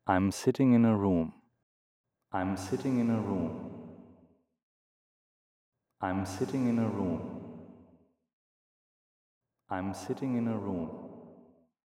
Rauschen als Hall

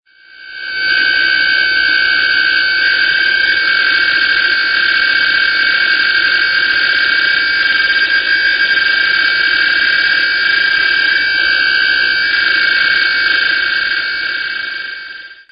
DCF77 schlecht; DFC77-Auswertung

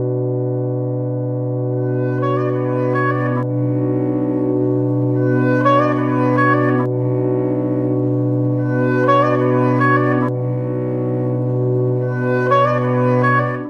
kreuzkrone 419 Hz

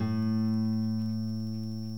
gitarre ton A kurz

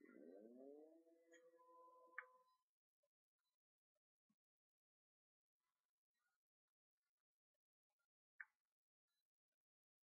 Piiuu

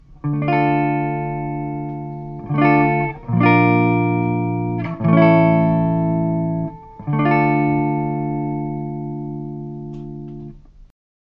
primitiv chorus

